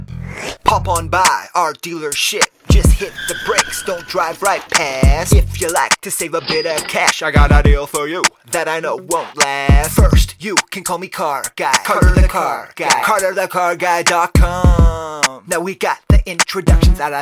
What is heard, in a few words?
rap; toyota